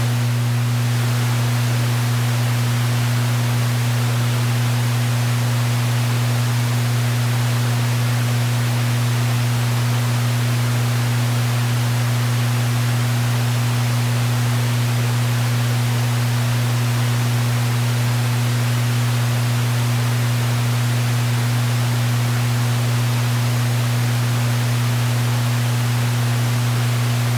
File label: roomtone milkbar